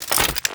Spear Gun Reload, Weapons; Warframe; Corpus

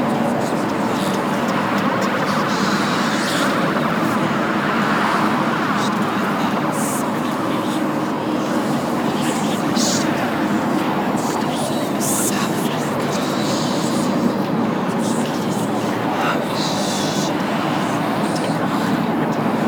Tunnel Loop; Warframe; Tau; Circles Of Hell

